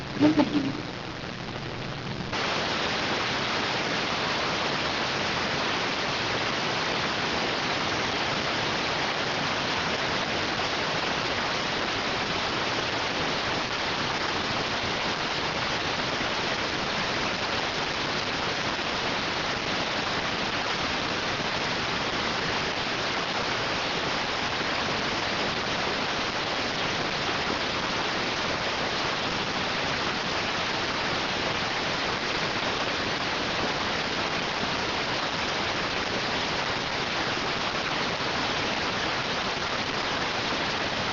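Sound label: radioteletype receive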